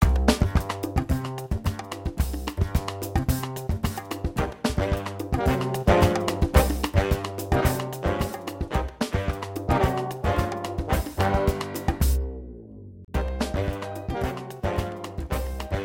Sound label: Music; BornAgainVirgin